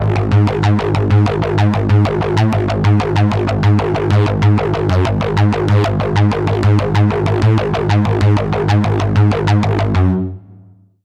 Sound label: Bass; Routed Sound; Spire Expansion - Doom Carpenter Vol.1